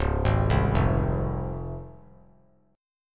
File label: Db Major 7th Db down; arpeggiated; JGuitar